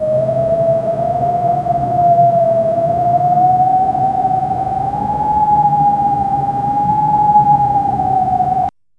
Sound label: the sound of wind whistling through a canyon